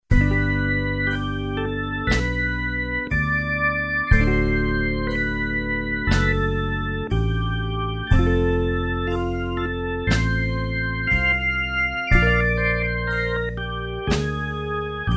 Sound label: 30bpm; tempo